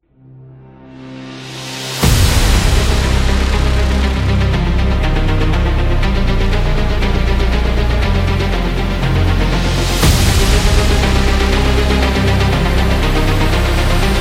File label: Epic Military